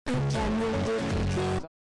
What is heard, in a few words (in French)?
camion de pompier earrape; vilebrequin